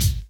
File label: Dance Drums; Best Service ProSamples vol.15; AKAI